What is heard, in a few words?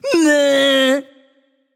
tongue; smite; kukulkan